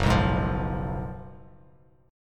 F sharp Major 7th Suspended 2nd Suspended 4th, Bass Standard; strummed; JGuitar